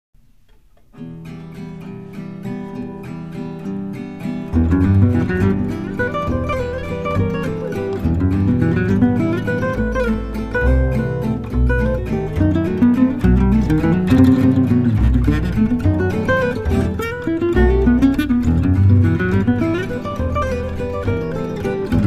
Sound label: Musette Guitars